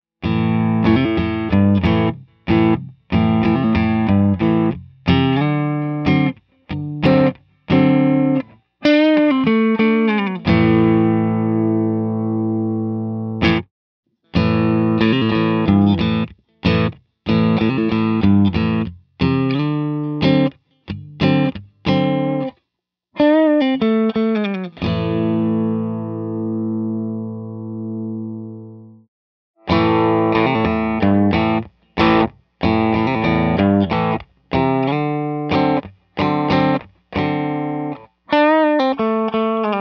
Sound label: light dirt, custom pickups